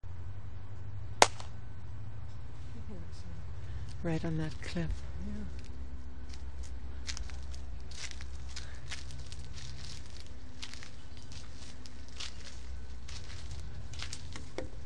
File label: quarry